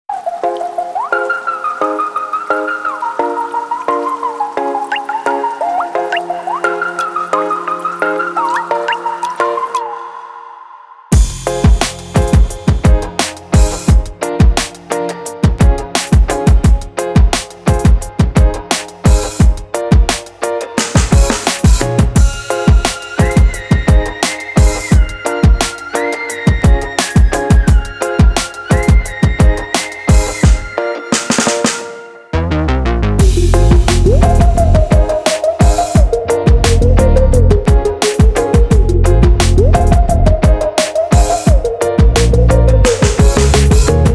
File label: Instrumental